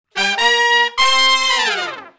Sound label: Shuffle Blues Riff (C) high unison falloff